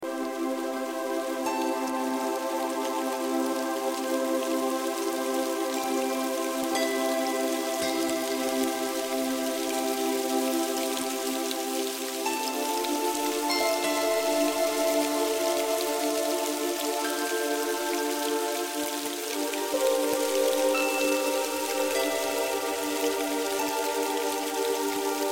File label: Heavy Rain; RELAX-NATURALEZA